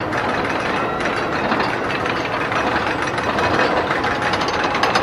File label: Roller Coaster Tight